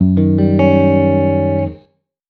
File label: Guitar One Shots F°7; Guitars; Ghosthack; Advent Calendar 2019; Xmass